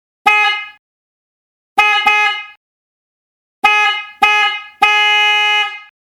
compact low

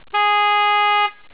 alpha2 compact low, car horn

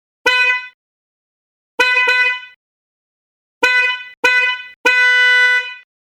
compact high

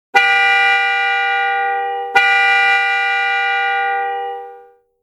arena echo

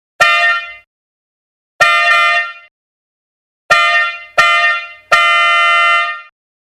super high tone

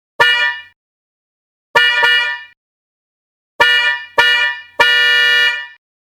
air bullet

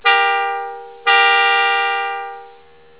arena echo

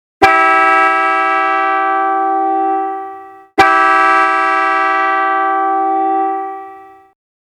dolce echo

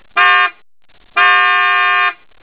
aero spiral

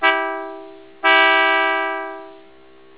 dolce echo

